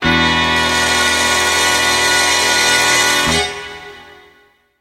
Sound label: Building Fanfare